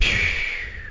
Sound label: pig snore; Angry Birds Space